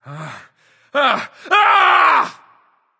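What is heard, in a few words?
nonverbal fear; smite; voicepack; merlin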